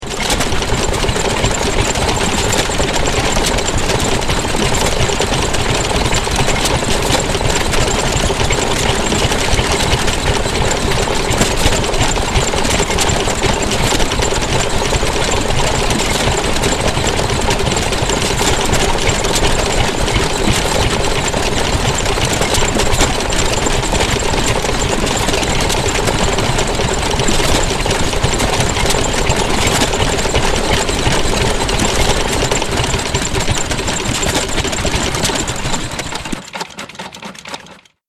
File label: Room Full Of Rickety Engines, Detailed sound of multiple vintage motors spluttering; Detailed sound of multiple vintage motors spluttering and eventually grinding to a halt.